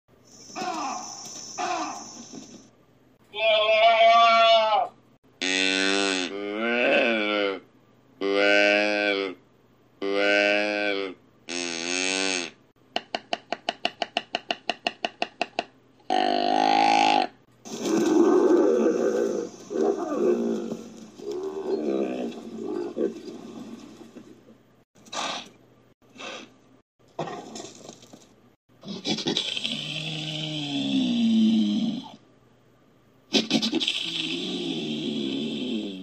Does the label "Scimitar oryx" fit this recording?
Yes